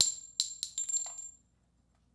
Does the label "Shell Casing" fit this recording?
Yes